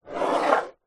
household aftershave or perfume glass bottle slide on wooden dressing table; zapsplat